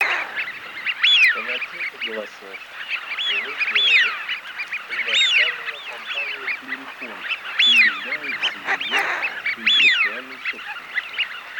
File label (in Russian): Свиязь Fischione; plurifon